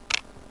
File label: pen, shutter